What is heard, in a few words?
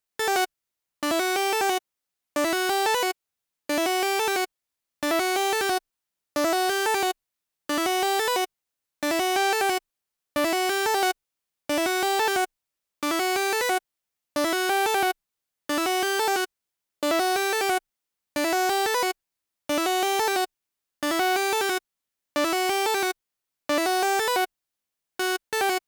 intro mel